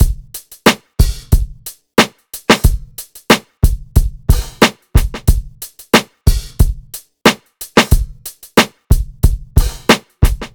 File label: Drum Loop - 91 BPM, Boom Bap; Dreamers; Cymatics - ANALOG EVOLUTION Beta